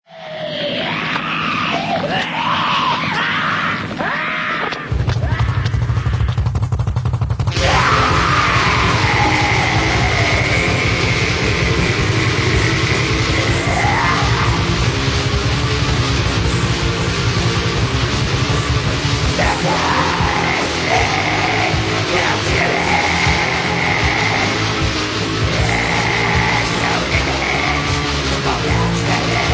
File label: punk